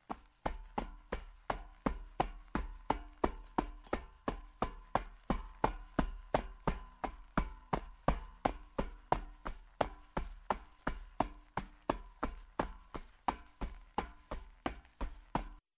footsteps sneakers steps fast

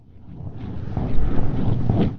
spinning

